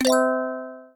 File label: next button; inlogic-zootrivia